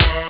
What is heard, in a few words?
bas drum + voice; Amiga Soundtracker Sample Packs